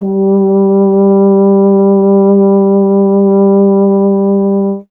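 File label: BRS F.HRN, Solo Instruments, French Horn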